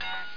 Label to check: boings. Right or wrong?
Right